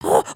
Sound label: freak out, emotes